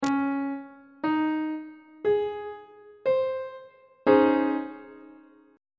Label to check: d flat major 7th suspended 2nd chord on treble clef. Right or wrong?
Right